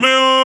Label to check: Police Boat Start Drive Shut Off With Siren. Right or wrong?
Wrong